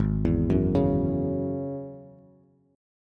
Ab 7th Suspended 4th Sharp 5th, Bass; Ab7sus4#5; 4,7,4,6; arpeggiated; chordshape; JGuitar

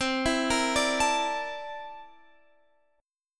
C 13th C down Guitar Open F; C13 arpeggiated

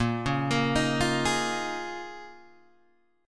Bb Minor 11th Bb down Guitar Open G

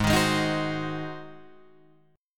G sharp 7th G sharp, Guitar Drop D

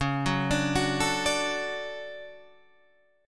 D Major C sharp, guitar

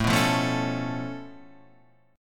Db Augmented 7th A

